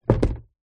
foley cardboard box fast food empty set down table